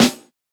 snare b key

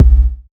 round kick sample b key; kick drum samples

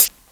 clean high hat sample A sharp; drum single hits one shots

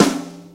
subby snare drum c key

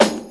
subby snare one shot a key

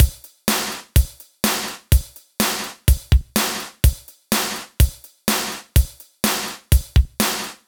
125 bpm breakbeat c key; Samplerate 44100 Hz; Bitdepth 24

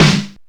warm sounding steel snare drum sample g sharp key

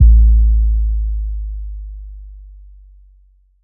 phonk kicks oneshot 808 a; kick drum

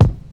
natural kick sound D sharp key; kick drum

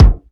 mid range kick drum sound F sharp key

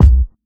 old school hip hop kickdrum c key

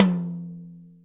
long room reverb tom one shot f key; tom drum samples

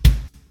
bass drum g sharp key

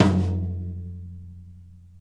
mid tom drum one shot f sharp key; tom drum samples

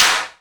clap single shot d sharp key; single hits one shots

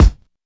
bass drum sample a key; kick drum samples

